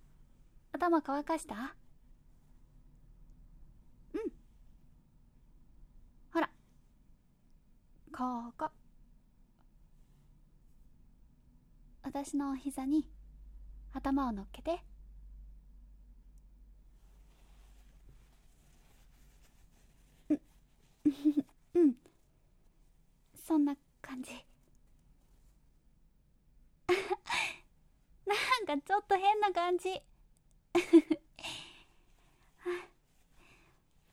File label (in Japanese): 耳かき右, ASMR